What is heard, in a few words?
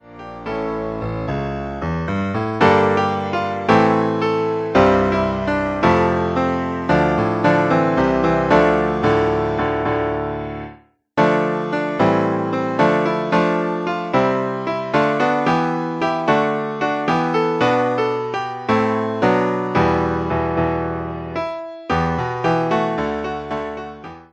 Verse for Palm Sunday accomp